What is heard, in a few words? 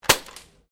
GUN TOY DART PISTOL FIRING; Toy Guns; Toys